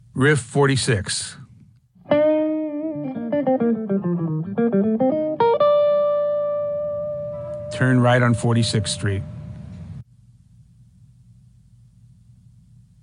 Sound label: Riff